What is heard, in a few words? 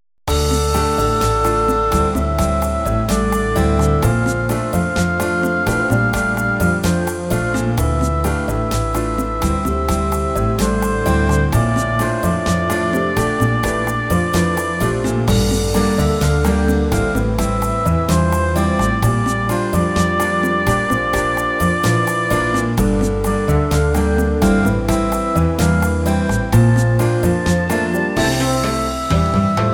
bossa; WolfRPGEditor